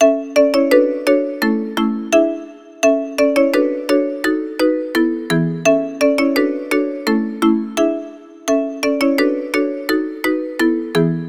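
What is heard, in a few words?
incoming call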